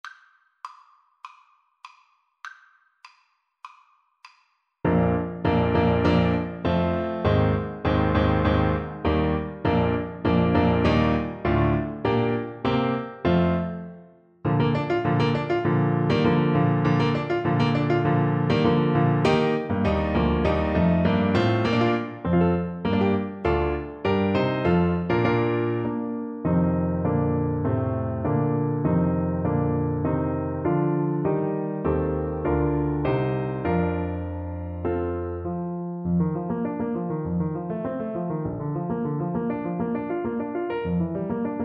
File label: Clarinet